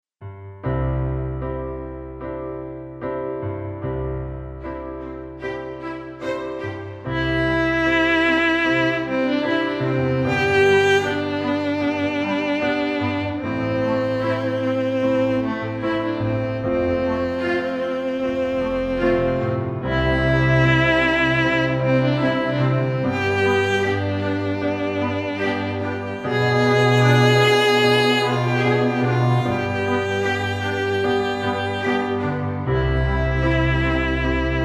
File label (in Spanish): Melodia